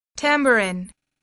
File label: tambourin